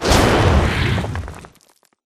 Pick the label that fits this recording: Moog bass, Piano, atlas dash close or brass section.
atlas dash close